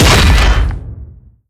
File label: step balcony, strider; creatures; Half Life Alyx